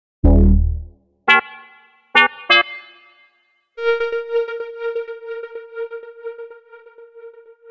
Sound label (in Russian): Сэмп Bass House